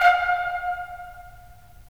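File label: brass trumpets staccato, right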